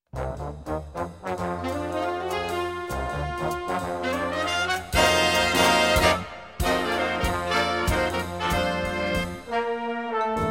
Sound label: Kicks and Sticks